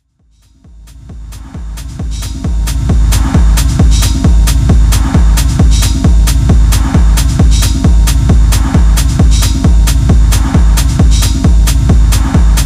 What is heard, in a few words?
Locked Groove